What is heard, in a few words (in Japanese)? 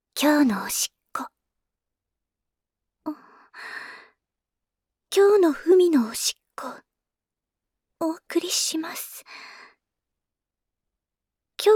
着衣のまま床におもらし